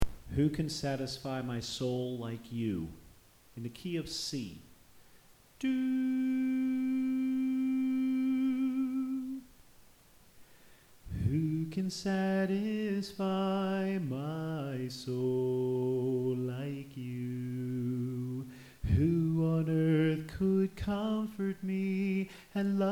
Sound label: Alto Left